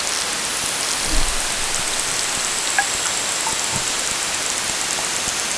pond

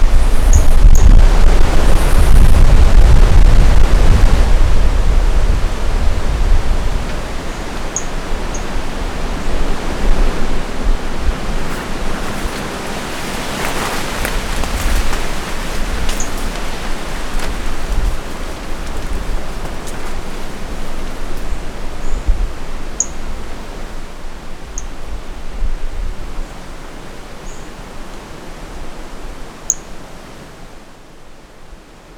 Brachycephalus mirissimus plus

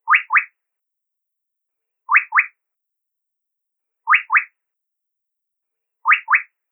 Male Hwee-hwee

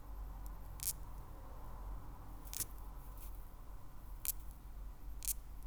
female mandibular protest sounds

